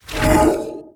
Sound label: change room